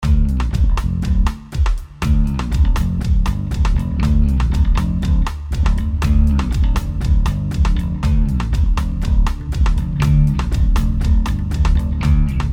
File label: Acoustic Boogie; Hindu